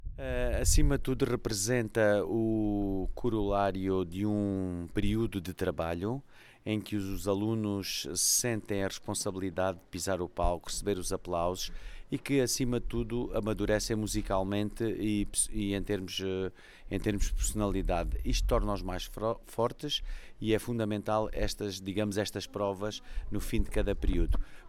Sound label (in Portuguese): cavaco; Natal